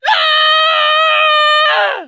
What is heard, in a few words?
woman yell; Mount&Blade Warband